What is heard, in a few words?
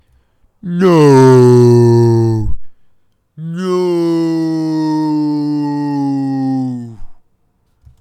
Slow Motion Scream In Agony